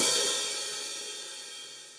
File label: Hihat Open Studio Basic; Drum Hits; Standard Hits; Ableton; Factory Packs; Session Drums Studio